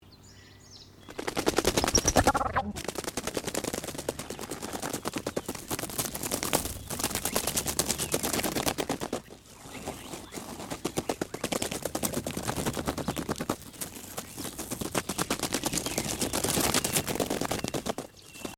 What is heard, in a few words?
Wing Flapping Song, turkey